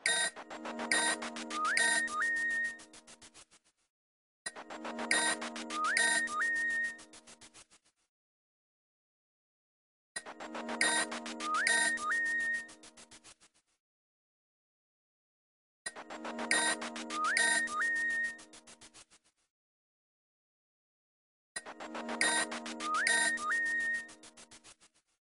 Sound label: alert tone feather Nokia ringtone